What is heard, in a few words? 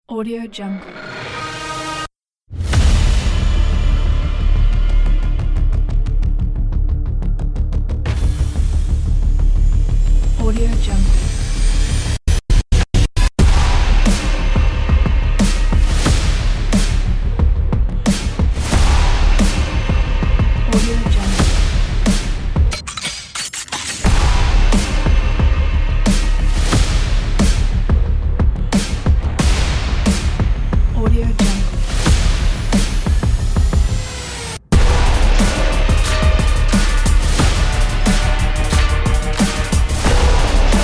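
flatline hybrid trailer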